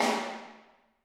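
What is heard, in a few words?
snare drum, Drums; Bob Clearmountain Drums I; SD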